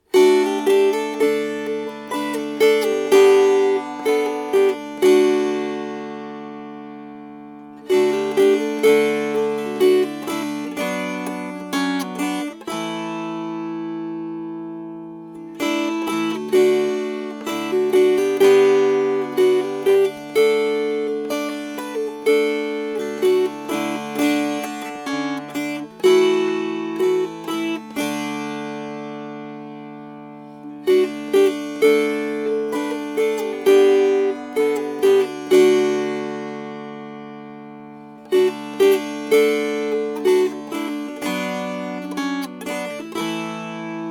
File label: Strummed; Mary of the Wild Moor